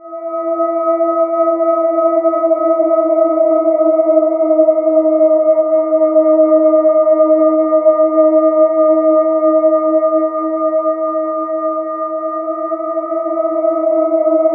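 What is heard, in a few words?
boat wreck, ominous drones; USB Soundscan vol.48; AKAI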